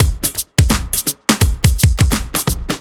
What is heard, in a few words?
Trip Swing Mix, 85bpm; off the grid